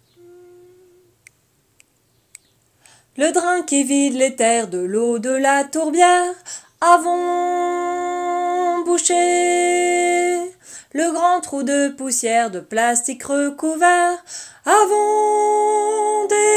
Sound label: soprano; Carmen